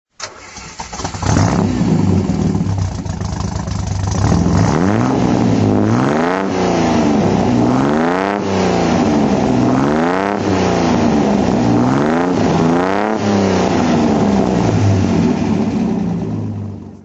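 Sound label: austin healey 3000 mk2a throttle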